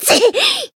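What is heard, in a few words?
Voice damaged, Japanese; tiramisu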